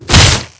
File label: attack; snowier